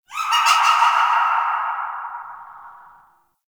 Canvas Laughs; Gameplay; Warframe; Shadowgrapher

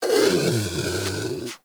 Predator Snore; Warframe; Hunting Critters; Characters

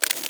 Screen Glitch; Warframe; Caliber Chicks Minigame

